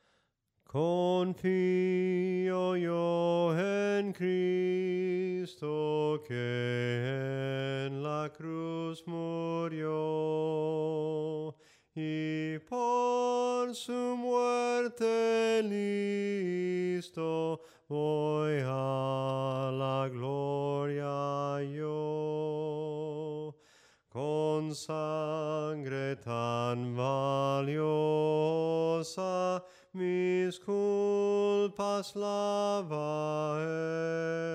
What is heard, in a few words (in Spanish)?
SOPRANO CANTADO, himnos; CONFIO YO EN CRISTO